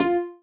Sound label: marimba rainbow; ゆめ2っき